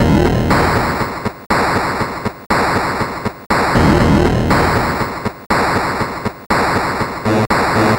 Atari 2600 Noise Drums

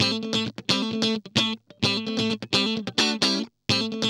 GUI, LICKS; 130 LICKS

